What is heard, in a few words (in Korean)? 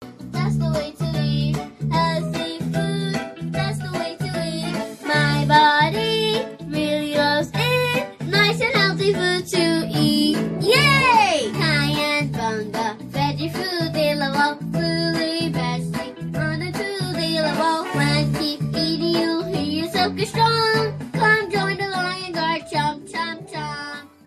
영어여 노래샘플